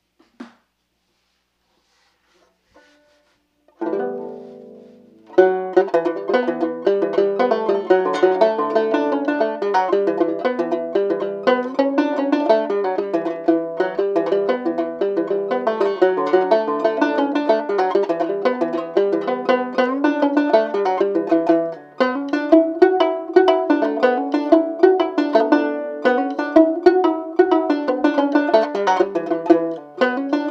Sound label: Swallowtail Jig